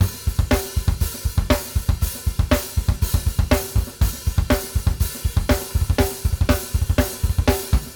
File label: BASIC, Drumworks